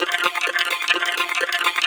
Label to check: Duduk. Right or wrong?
Wrong